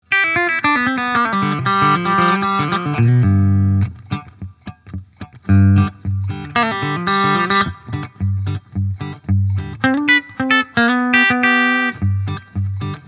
Gretsch G5422TG Electromatic Steg Country

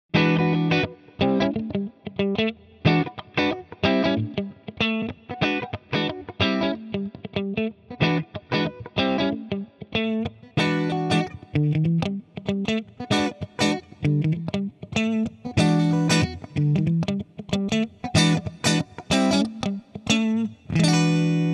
Clean Blend; Archetype Abasi

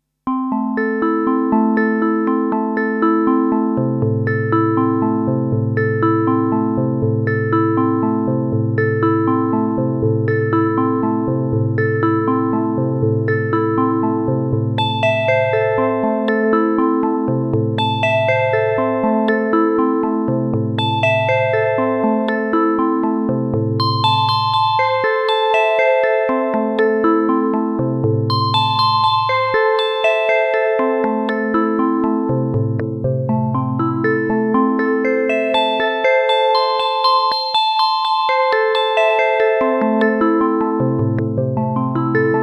Polysix Poly Arpeggio

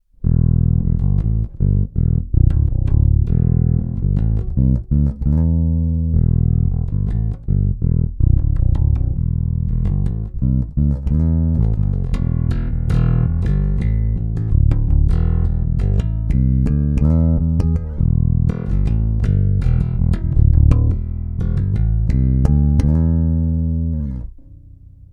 Neck Rock

